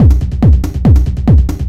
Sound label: 141 BPM, Drum Loops; Zero-G; Club Techno; Drum Set